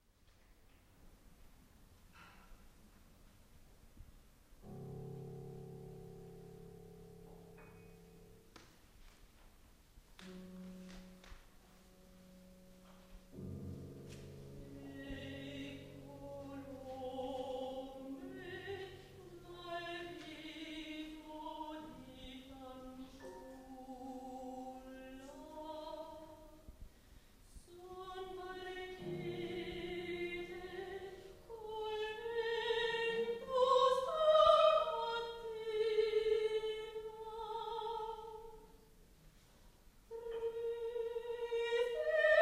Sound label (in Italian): voce pianoforte